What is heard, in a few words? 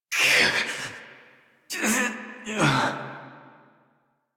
Death Adult; New War; Adult Operator